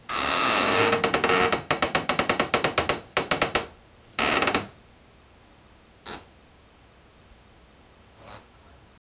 distant building creak

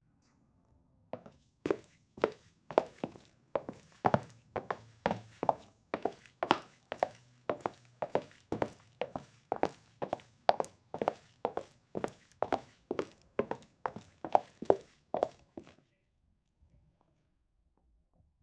high heels walking sound, clack

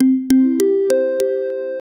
c major 100 bpm ascending, ascending melody

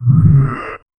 a braindead slack jawed, zombie

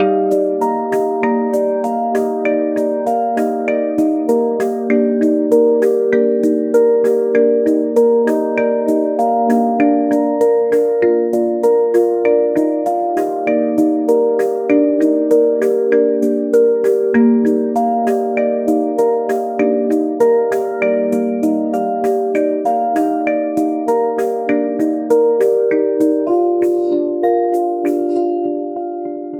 seamless loop 98, shaker

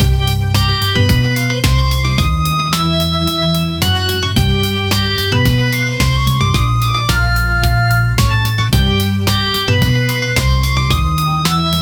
tense music; bat man

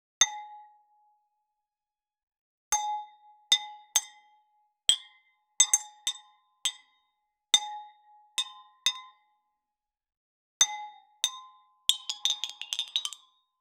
sound of a champagne

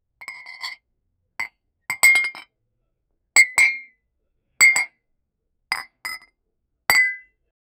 beer glasses clinking, pub

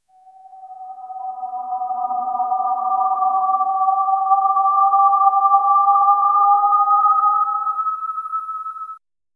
mystical golden swirl; radiant

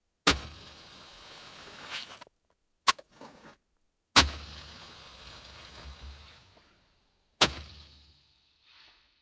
tire splash on wet road; environment

